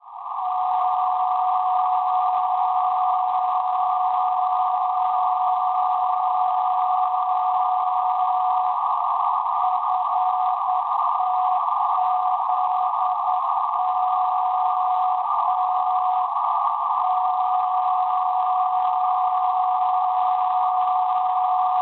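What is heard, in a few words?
non-directional beacon 468 khz; FTZ; Fritzlar Germany; Schwartzsee; Perseus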